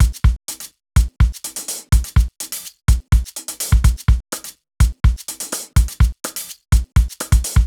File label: top basic, 125 Beats